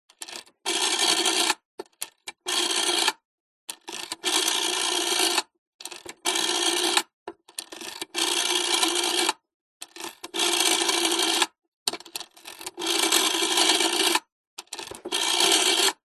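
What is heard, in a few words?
household telephone old rotary dial number; zapsplat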